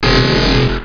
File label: TELEPORT; JOHNNY